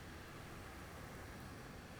pulses, BALAENOPTERA; MONACO 2022-07

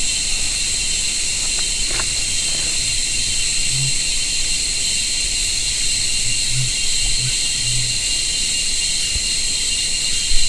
bird

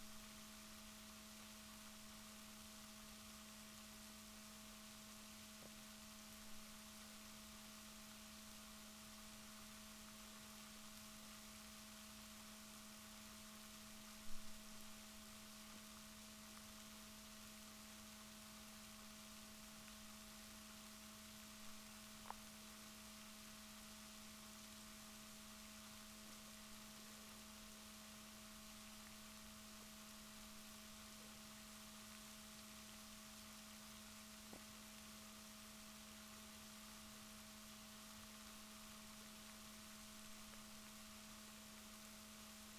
rorquals positif, Balaenoptera Physalus Chile

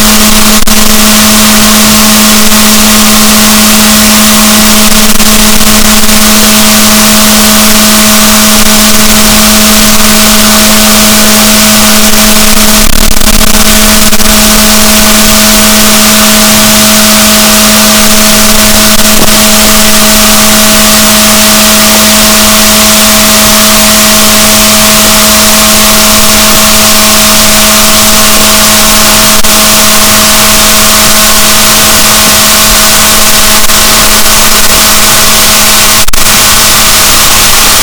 rorquals negatif, boat

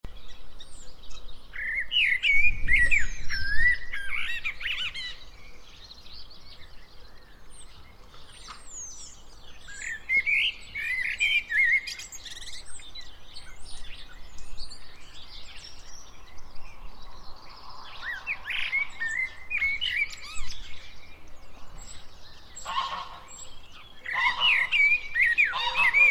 Turdus merula; SETE

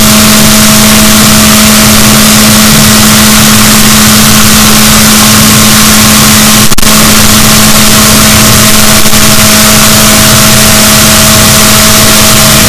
rorquals, Balaenoptera Physalus, boat

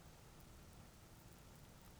pulses, BALAENOPTERA; MONACO 2022-07

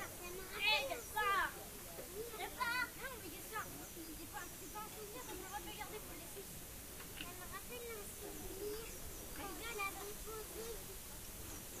rayol farm; SEAMED

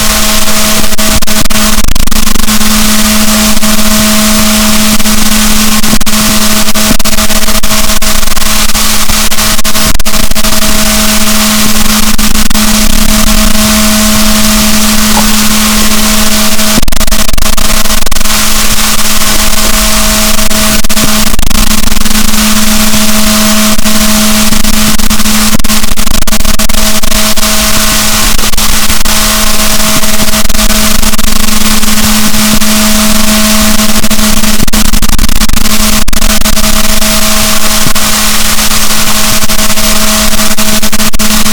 rorquals negatif, boat

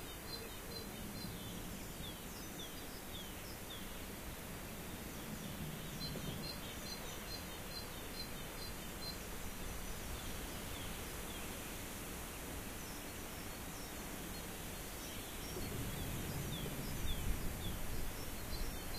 rayol farm; SEAMED

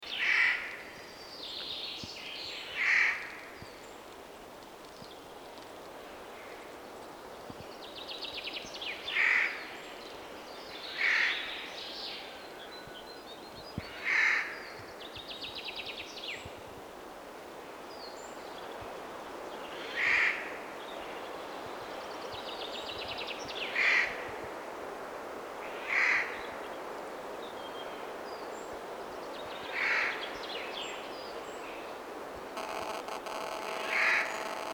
Garrulus glandarius; SETE

